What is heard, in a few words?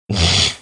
snorting